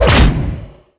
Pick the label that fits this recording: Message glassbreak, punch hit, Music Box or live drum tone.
punch hit